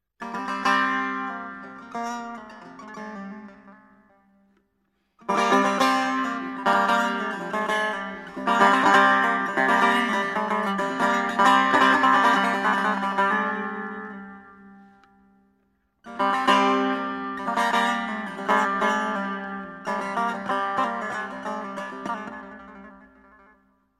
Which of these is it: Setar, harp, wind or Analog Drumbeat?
Setar